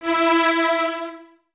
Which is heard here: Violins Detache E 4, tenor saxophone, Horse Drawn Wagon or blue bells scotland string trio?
Violins Detache E 4